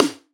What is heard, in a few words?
Snare, Dubstep One-Shot Drums